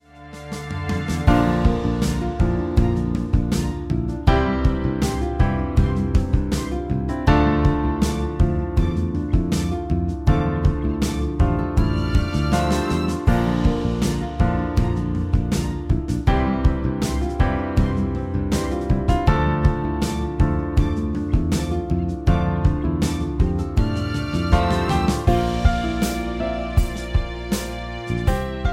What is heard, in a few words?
Sad Soundtrack, ringtone; MIUI12